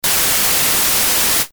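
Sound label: white noise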